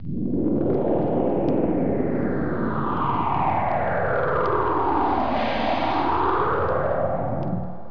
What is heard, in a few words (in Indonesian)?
suara mobil